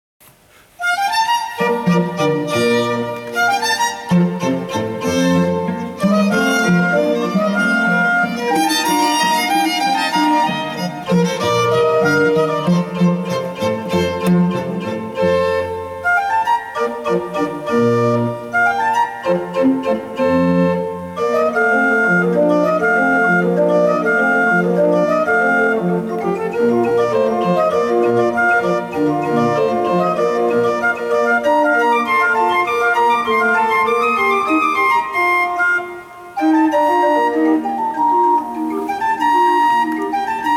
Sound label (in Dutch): Concert voor orgel strijkers en continuo